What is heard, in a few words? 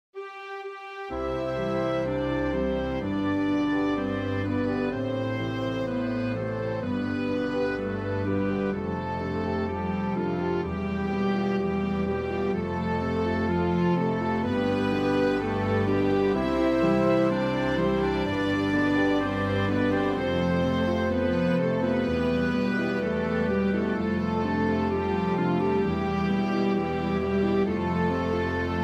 Universe Song Orch